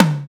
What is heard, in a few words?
Terror Tom, Dubstep, Percussion; Drums; One Shots; Cymatics; Dubstep Starter Pack